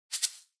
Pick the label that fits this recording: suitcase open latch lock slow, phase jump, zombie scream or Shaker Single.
Shaker Single